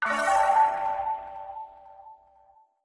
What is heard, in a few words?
UI Craft Finish; Valthirian Arc Hero School Story